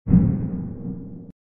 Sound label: distant explode; Dystopia; fortress